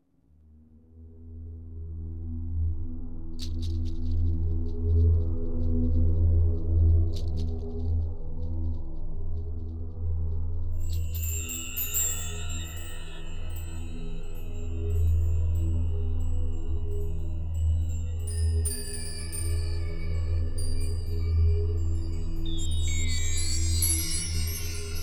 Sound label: Amb Outdoor; The Hobbit; PS2